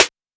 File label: Snare Box Slap, drums